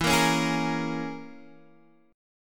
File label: C sharp Minor 6th E